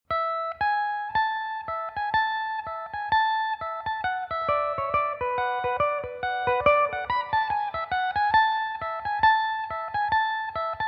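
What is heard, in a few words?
A major, irish mandolin; devil among the tailors; belmuse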